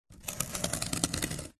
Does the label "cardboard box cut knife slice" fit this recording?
Yes